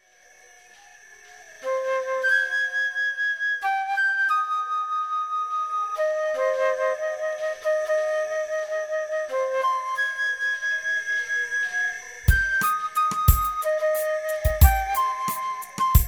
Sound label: Raindance Flute